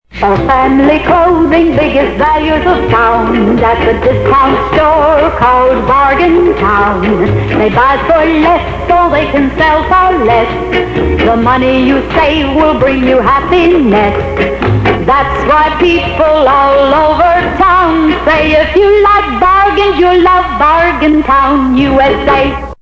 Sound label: jingle